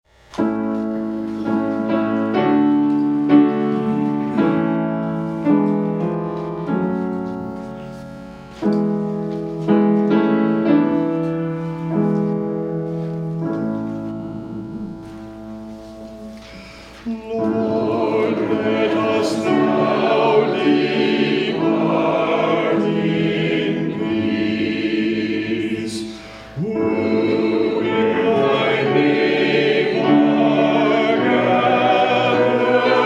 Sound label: Hymn; Lord, Let Us Now Depart In Peace